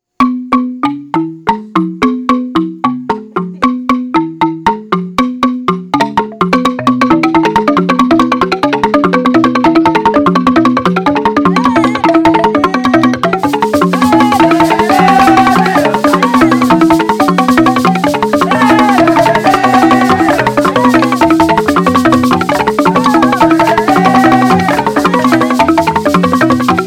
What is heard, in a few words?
marimba